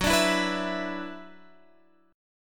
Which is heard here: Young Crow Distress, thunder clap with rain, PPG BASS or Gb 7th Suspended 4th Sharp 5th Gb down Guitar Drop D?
Gb 7th Suspended 4th Sharp 5th Gb down Guitar Drop D